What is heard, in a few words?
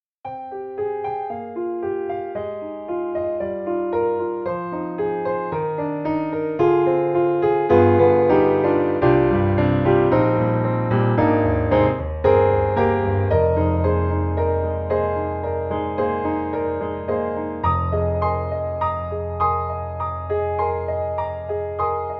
Mini Grand Practice Room Piano; AIR Music Technology